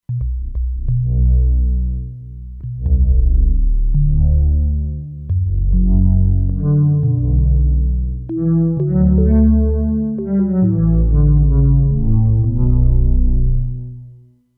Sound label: Story Bass; Factory Presets; E-mu Proteus-2